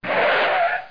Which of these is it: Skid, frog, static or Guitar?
Skid